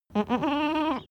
goat baa calm; natur tiere; ziegen

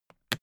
briefcase plastic latch lock close; industrie wirtschaft; bueroarbeit

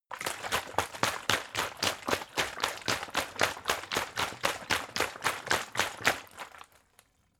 bottle water big shake slow; gastronomie; berufe

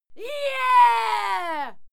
female cheer yeah

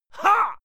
male fight attack; schreie; menschen; soundpool

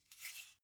car flip key handling short

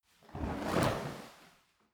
fire torch whoosh fast soft; swooshes-swishes

